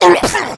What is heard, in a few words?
been shot, infected; npc, action; Left 4 Dead 2